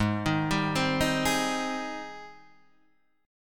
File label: Ab Major 7th Ab down Guitar Standard; AbM7 arpeggiated; JGuitar